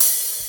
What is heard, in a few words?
present open hi hat sound g key